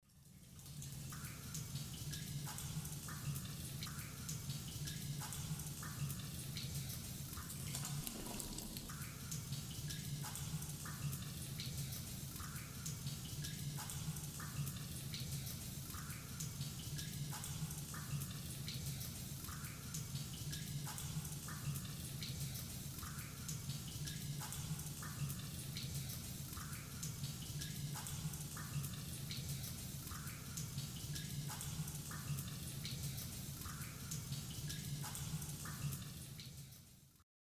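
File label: Grotto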